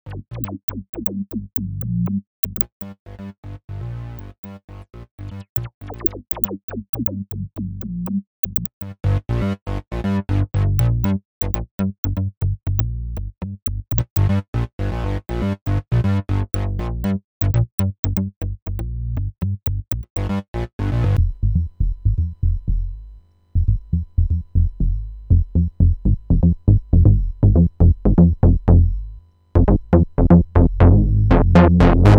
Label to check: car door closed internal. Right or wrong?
Wrong